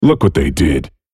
spellcast effort

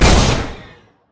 dash; Vi

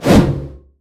basic attack; jayce

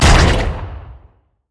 elastic slingshot; LoL; zac base

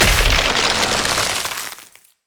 ring of frost; LoL; lissandra

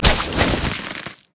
snowday minefield land; LoL; ziggs snowday

